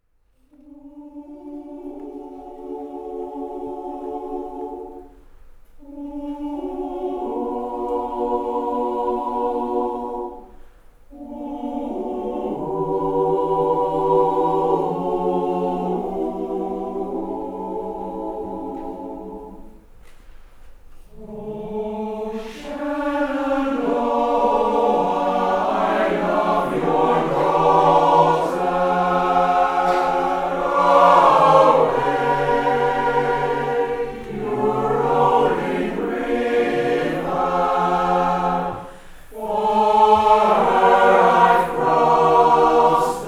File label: SATB acapella